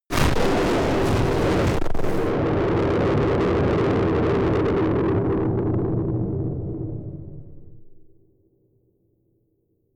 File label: distorted explosions